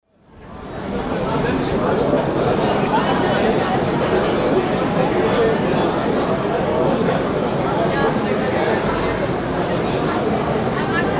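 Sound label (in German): Gemurmel Menschenmenge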